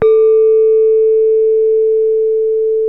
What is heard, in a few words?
gangsa lo, gamelan; gravityharps